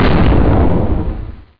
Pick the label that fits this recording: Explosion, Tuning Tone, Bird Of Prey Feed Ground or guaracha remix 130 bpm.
Explosion